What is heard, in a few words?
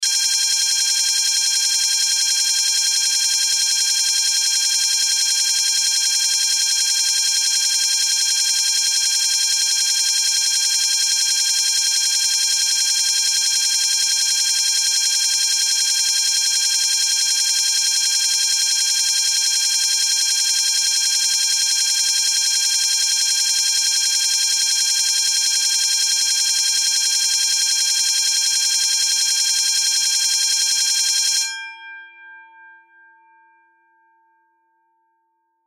Alarm Fire Burglar Security System Bell Ring Bank; Emergency